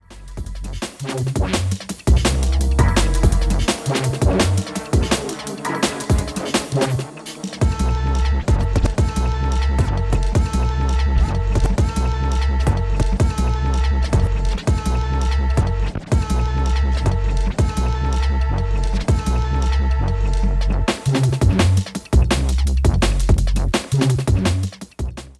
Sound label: loop break